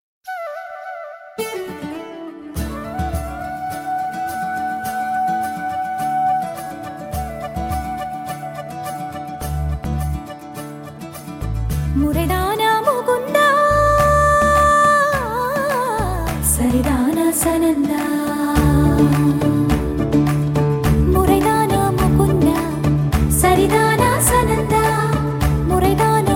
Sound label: flute; tamil; bhahubali 2